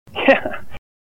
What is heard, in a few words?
"Yeah ha"